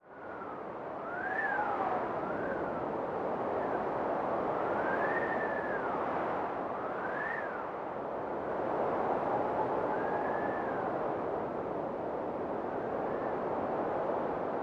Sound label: wind